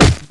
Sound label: fist strike; zoochosis; lazynap; whalekiller deepsea monster